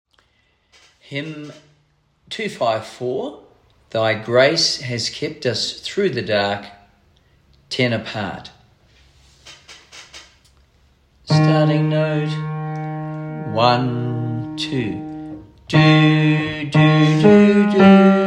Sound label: Tenor SATB; Thy Grace Has Kept Us Through The Dark; Hymns for Jubilation; house choir